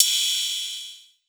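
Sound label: Open hi-hat; Trance; Zero-G Trance Inducer; 90 s Sample CDs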